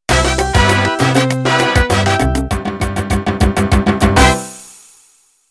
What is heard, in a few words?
Salsa End